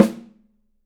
Studio Snare, 3-D Audio Collection, 3D Percussives; E-MU Producer Series Vol. 5